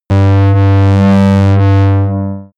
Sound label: shot buzzy wave, games zaps buzzes